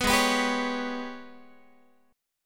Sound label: Ab Minor Major 7th Double Flat 5th Ab, Guitar; AbmM7bb5; strummed